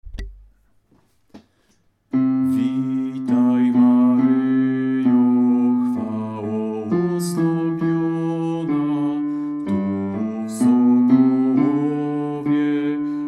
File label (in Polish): bas; Witaj maryjo